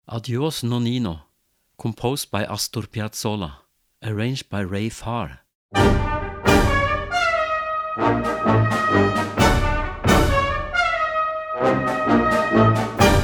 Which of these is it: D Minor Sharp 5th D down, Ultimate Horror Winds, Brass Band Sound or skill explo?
Brass Band Sound